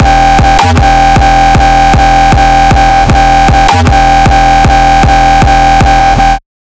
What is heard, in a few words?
kicks loop 155; GhostHack